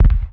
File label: damage hits explosions